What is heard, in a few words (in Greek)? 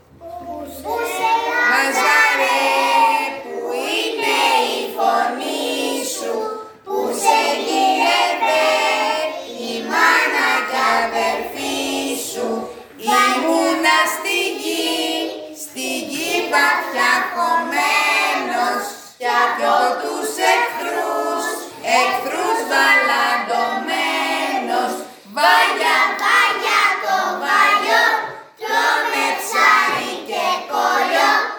Κάλαντα